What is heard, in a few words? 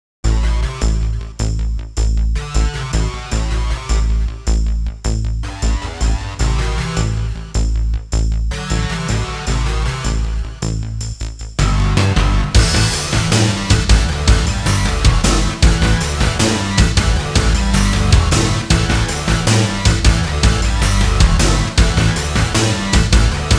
Death Match, pc engine; World Heroes 2; THUNDER & LIGHTING (Death Match 1)